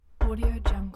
door knocking

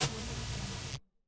scifi cyber explosion; stormwaveaudio

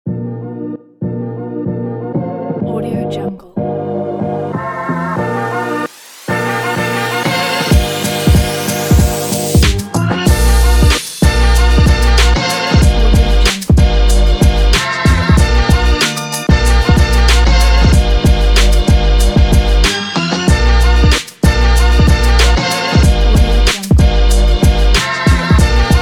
up beats

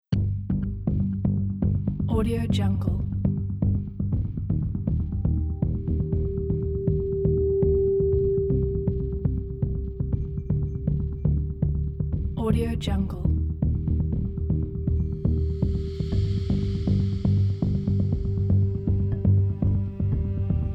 tension pressure point

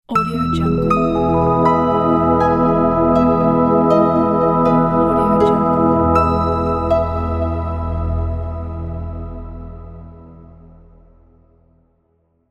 atmospheric logo

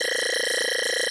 laser beam stun

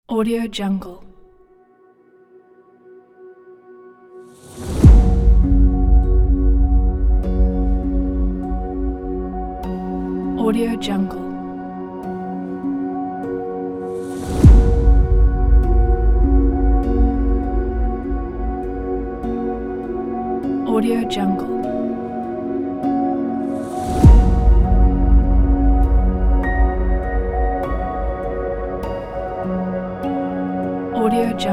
relaxation yoga

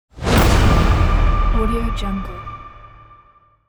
cinematic whoosh impact

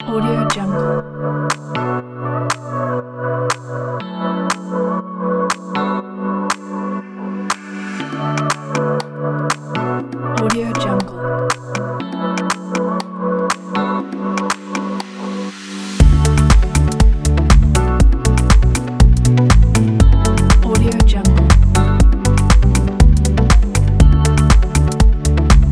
calm lounge background

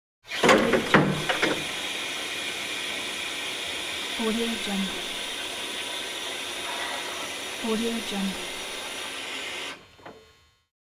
electric forklift

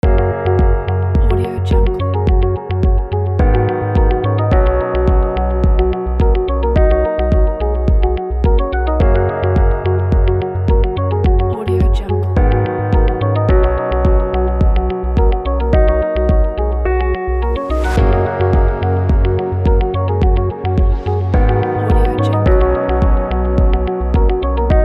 the positive medical

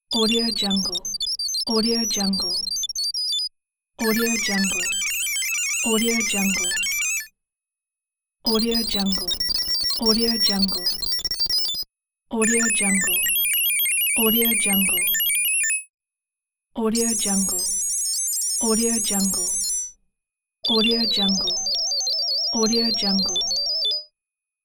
ui data processing

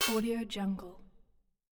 scifi interface hybrid button

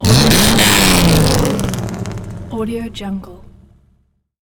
rally car high revving

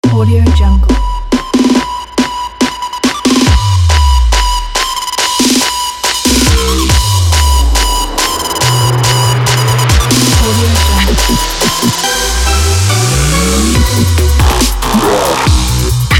stylish trap mission; iamaudio

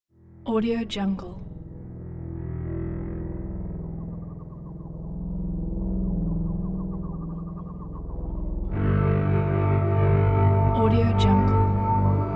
atmospheric soundscape

